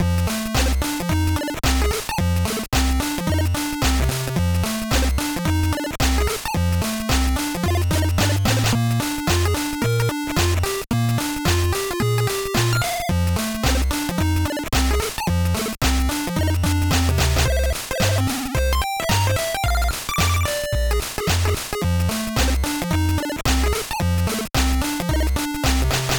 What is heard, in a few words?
minigame; music teaser